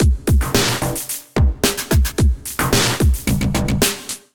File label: Kit groove 110 bpm; MIDI Clips; AstroPlayne; Ableton; Drum Essentials